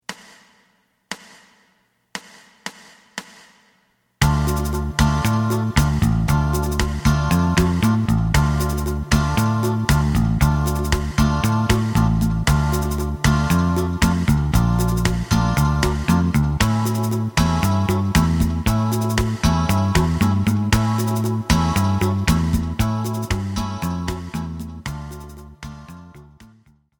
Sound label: lead; エレキバンド用カラオケ